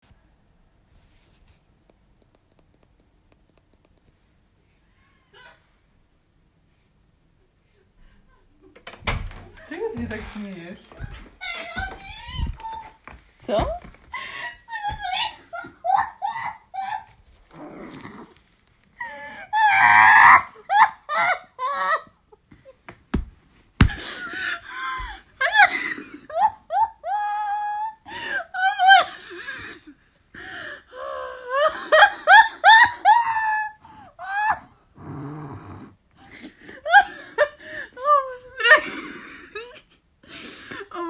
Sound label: Dorky Contagious Female Laugh